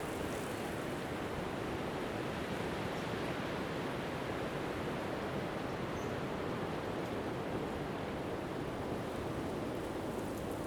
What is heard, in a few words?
amb winter